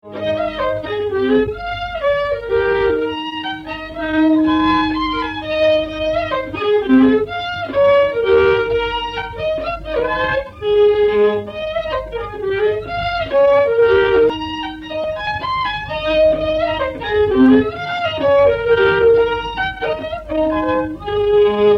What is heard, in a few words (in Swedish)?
polska